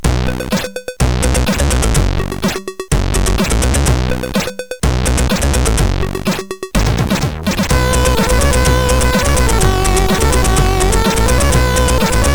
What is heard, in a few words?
Sounds Cool, CSG8580R5